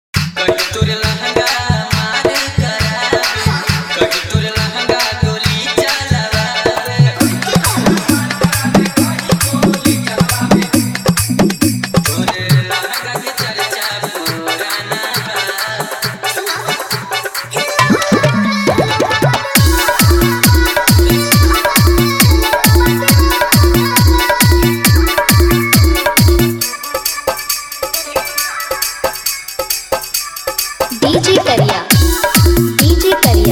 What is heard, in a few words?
Hard Jhankar Bass Dj Song Mix